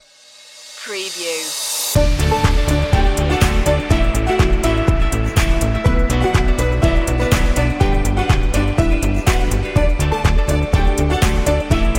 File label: background corp